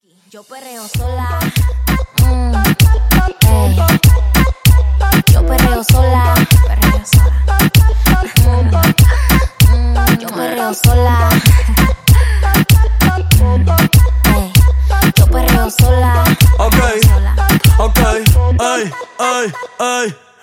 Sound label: Latin Reggaeton Redrum; Remixfreakz